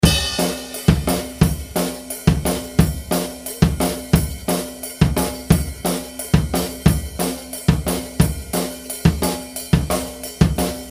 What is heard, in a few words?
170bpm open hh